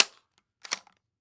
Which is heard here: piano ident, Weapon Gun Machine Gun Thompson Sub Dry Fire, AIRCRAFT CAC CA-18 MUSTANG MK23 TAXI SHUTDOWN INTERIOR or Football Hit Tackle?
Weapon Gun Machine Gun Thompson Sub Dry Fire